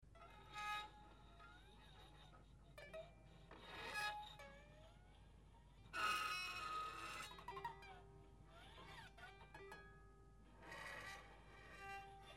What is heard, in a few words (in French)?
archet mix, harpe; touchers de la harpe